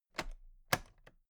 toolbox latches open regular double

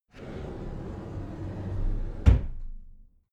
cupboard slide door close soft

